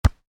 Volleyball hit soft, Volleyball (Exterior): Hit Soft; Sports; Miscellaneous Sports